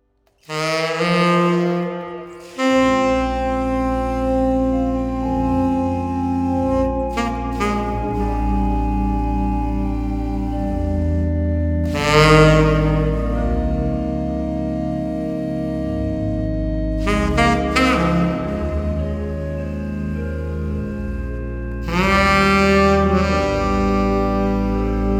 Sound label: jazz lieder